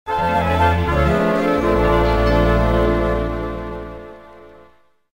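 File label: Sting Start Light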